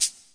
bright cabasa; Western and Latin Percussion; single hits

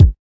kik; Kicks; single hits

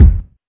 classic hh kick, Classic Hip Hop; STYLE KITS (Hip Hop, Etc); Classic Hip Hop Mega Kit

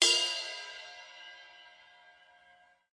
Gothic Ride; REAL LIVE KITS; Osama Qureshi DrumKit

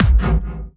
hard kick double echo; Kicks; single hits

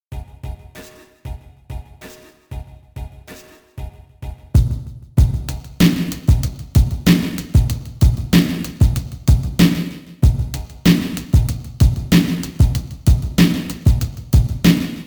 Hip Hop

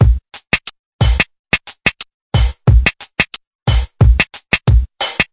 swing minimal, 090 bpm, drum loop

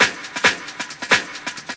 135 breakin snares, drum loops and breaks

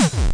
obnoxious kick

